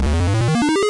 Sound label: ball hits hole, golf